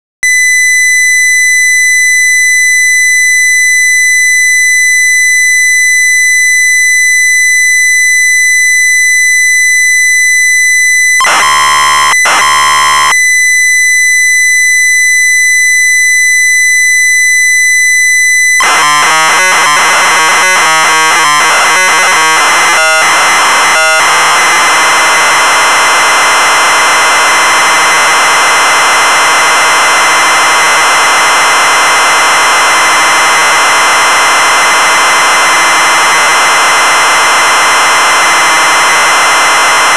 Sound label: MAJOR SCALES; Knights